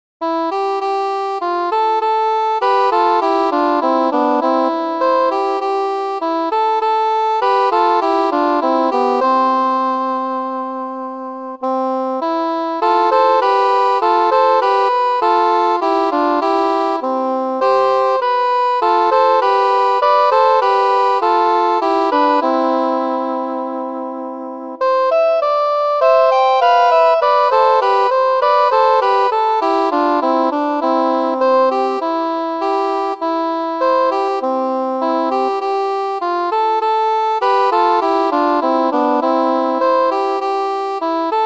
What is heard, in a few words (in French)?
petit duo en ut pour saxophones